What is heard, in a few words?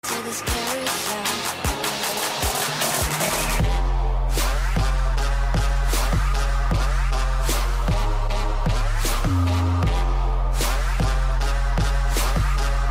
carousel cool ringtone